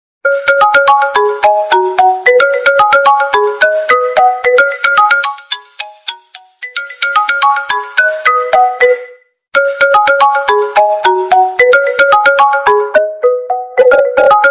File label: ring, نغمة رنين عادية للايفون; رنة هاتف جميلة